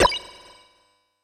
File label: Spit; Super Smash Bros; Kirby